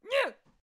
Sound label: Peasant Attack Vocals